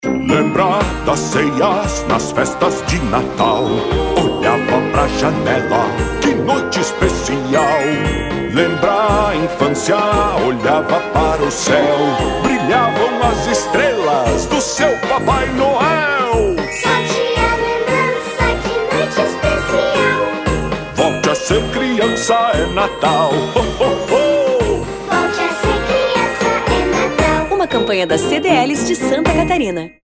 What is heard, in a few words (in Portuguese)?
Jingle Natal; FCDL 2022